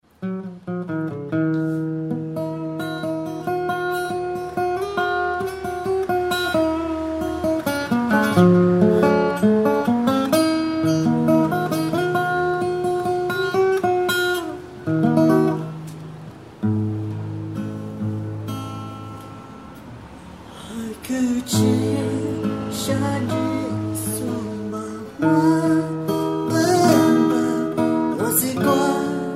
Lisu folk music

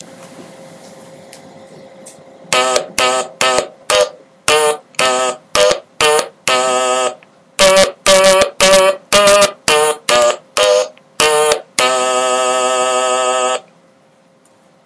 star wars death march stylophone

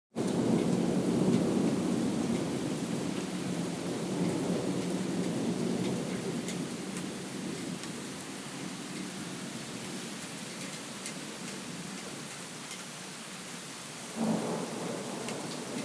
thunderstorm

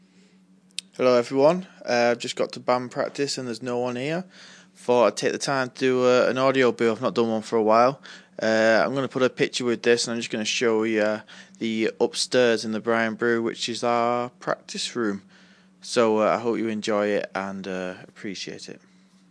band practice

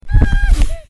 hehe

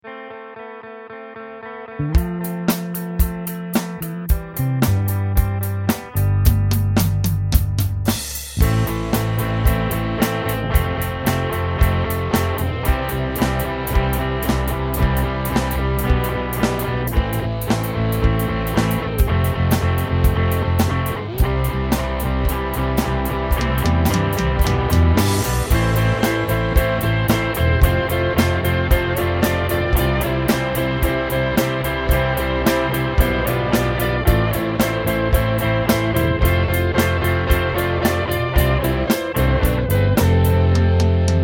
rock

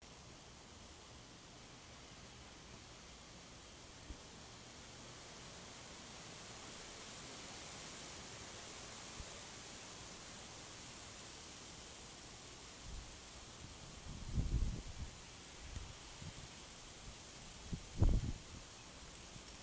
wind and wistles

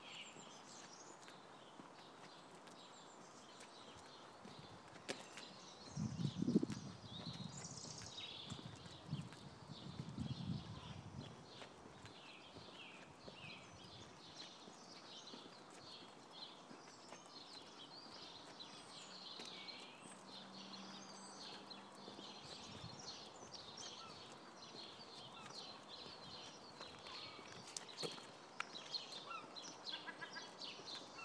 twilight chirpings

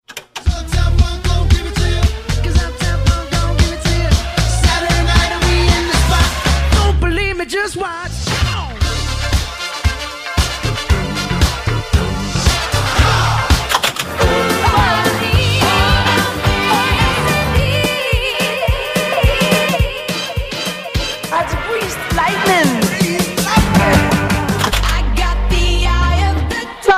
car karaoke; on breakfast weekday mornings at 8 15am

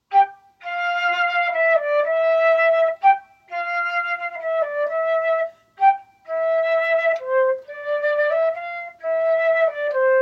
Medieval Dance Tune

